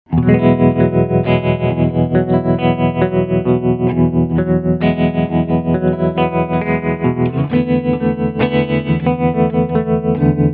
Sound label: vintage trem; mobius